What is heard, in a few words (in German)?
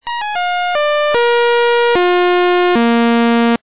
Naturtoene B, Blech